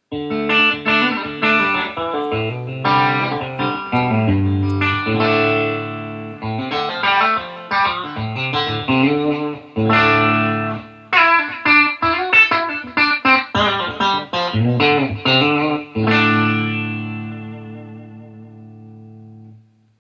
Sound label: Bassman ´59